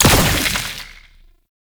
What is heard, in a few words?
Pistol Projectile Embed; Weapons; Warframe; Tenno; Temple Signature